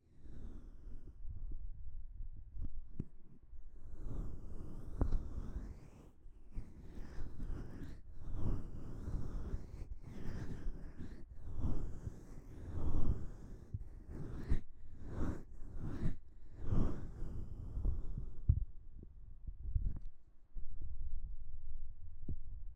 素手でお耳をマッサージする音(片耳ずつ)

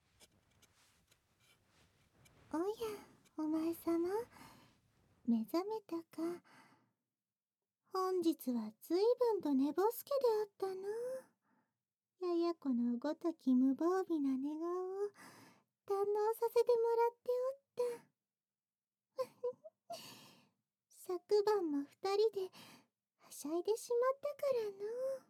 いつかの午後の耳かき

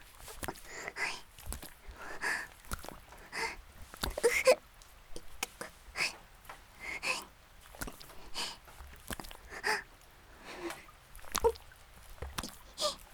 雨降りバージョン ギシアンループ; あ～にき！いくら出す?

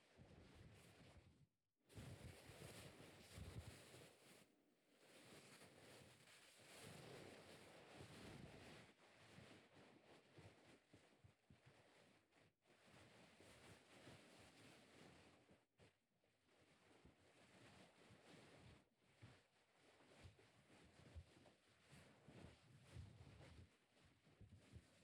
フェラ ASMR; 便所フェラ

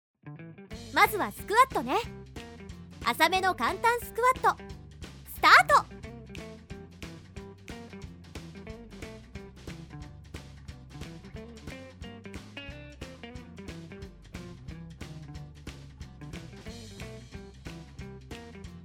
ループ用BGM

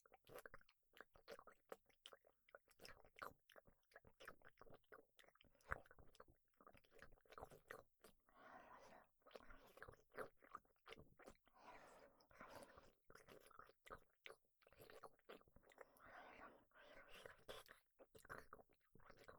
フェラチオ モノラル; フェラチオβ

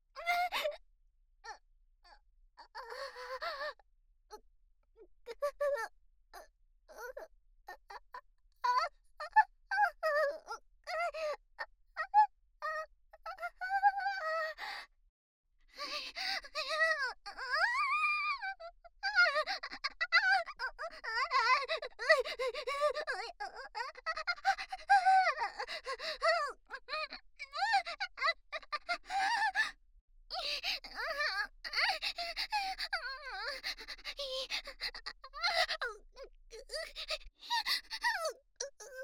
レイプ SEX・無理矢理に媚薬を使われ泣きながらの激しい喘ぎ声と悦びの声; おとなし少女系カノジョ

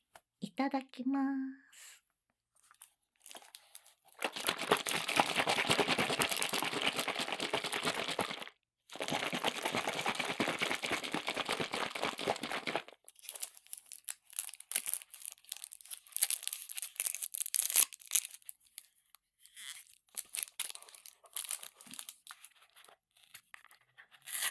紙パックジュース, ASMR

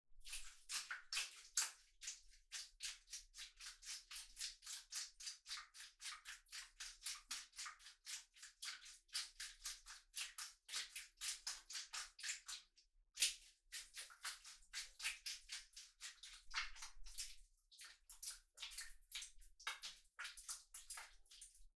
クチュ音 棒 スパート; 3DIO; アダルトな音

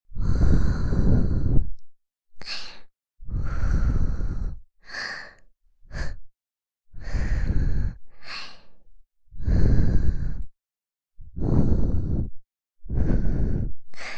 耳ふ～; ヒカリ

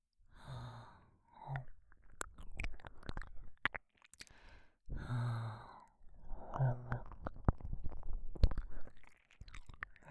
吐息いっぱい耳舐め男性左

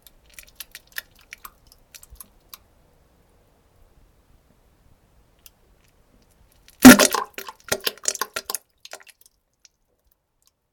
なんべん; むむちちち（ちゃ ちゃ） ち ち ぶぼりゅぶ（ぼだたたっ たっ っ）ぶ ぶ ち ち ぶ ち 約18秒

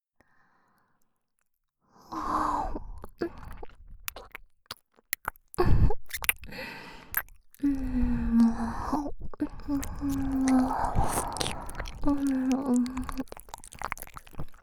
耳舐め 両耳; フレンド→ラバー

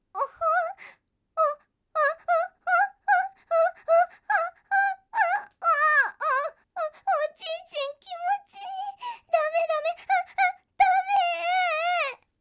バイノーラル おほぉっ！♥おっ！♥おっ！♥おっ！♥

